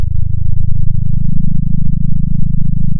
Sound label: pop, sound of a small motorcycle